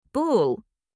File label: Bull Sound; guess the animals